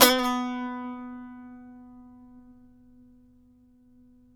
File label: SAZ; Spectrasonics; Hans Zimmer Guitars VOL-2